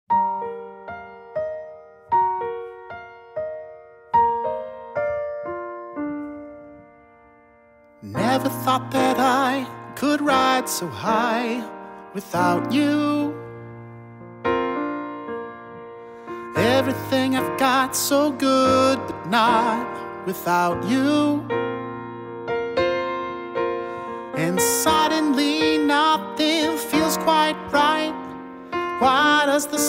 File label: with Vocals